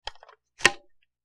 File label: Shell Loaded Shotgun